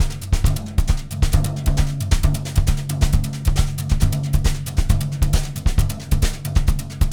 samba, brush loops

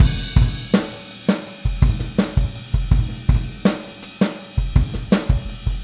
Boogaloo Chorus Full Mix, 82 BPM; Drums from the Big Room The Mixes

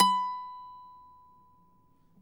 MNDLN B4; Sonic Reality; American Heartland

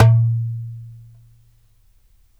DARABKA, Mediteranean Traditions, PERCS

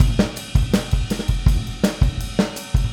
Boogaloo Chorus Full Mix, 82 BPM

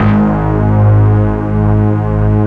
YAMAHA FM; Rubber Chicken Software Sample Bank

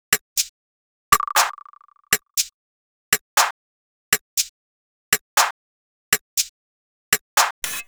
Percussion, Classic House; EDM; Sonic Foundry; Afterhours EDM

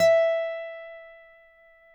TAKA FINGER E; Guitars

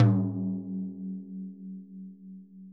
ROTOTOMS; Sonic Reality

